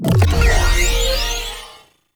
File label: Hack UI Success; Warframe; The New War; Narmer Hack